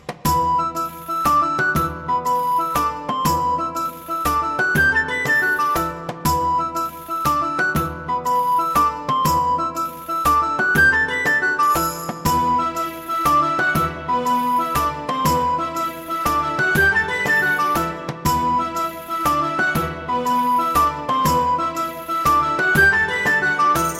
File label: Celtic Music